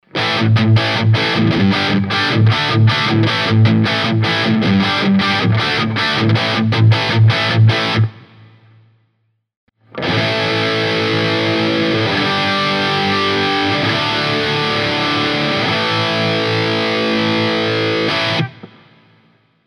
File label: dirty, humbuckers; flashover; pickups